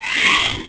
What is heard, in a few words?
roar